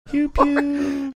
quiet pew pew

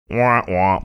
wah wah

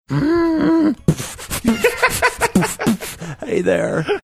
growling beatboxing saying hey there; like marge simpson; bill clinton

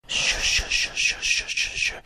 shush sound; hamburglar

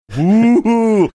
woohoo

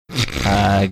snorting

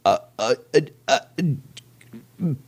making a loss for words sounds

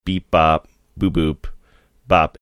beep bop boop boop bop

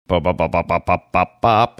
making thinking sounds

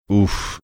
"oof"

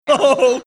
shocked oh ho ho

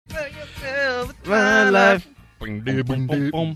singing to version of song without vocals

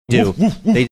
woof woof woof

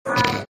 snorting

